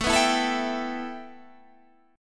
A 7th Suspended 2nd A

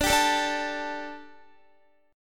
D Suspended 4th D, Guitar; Dsus4; strummed

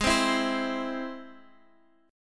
G sharp Augmented, Guitar, Drop D; x,x,6,5,5,8

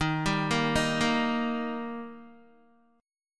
Eb Major Eb down Guitar 4E,4A,5D,5G,5C,6F; arpeggiated

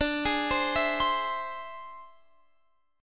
E Augmented 7th D

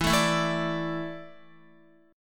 E 7th Suspended 4th, Guitar; E7sus4 strummed; x,7,9,9,9,10; JGuitar

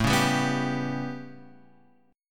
A 9th, down

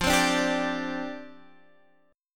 C Suspended 2nd Suspended 4th, F

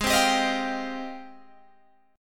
Ab Major 13th, Guitar, Open G

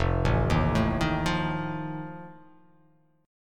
F 7th F down; F7 arpeggiated

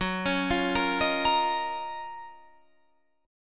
Gb Major 13th Guitar Open F

